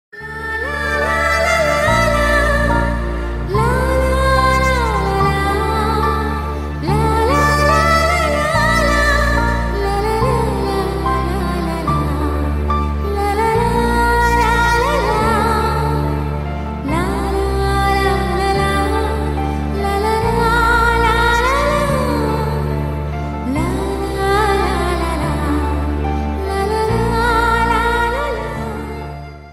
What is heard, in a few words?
humming ringtone